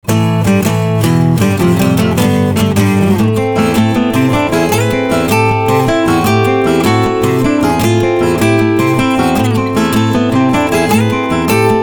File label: Acoustic; Grand Palace